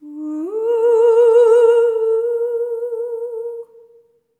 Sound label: SERENE, Symphony of Voices; Spectrasonics